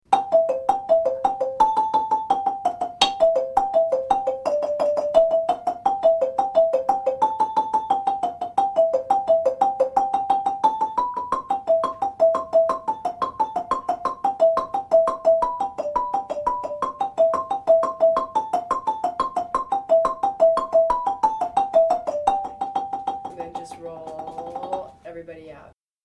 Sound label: lead finale, marimba; Single sticking; babamudiki